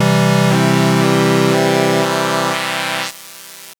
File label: Synth Pulse maj c2